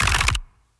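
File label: reload element; cstrike; valorant; araxys operator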